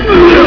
gurp; grimlock

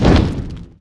large fire impact; spells

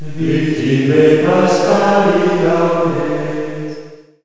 chant; lmctf

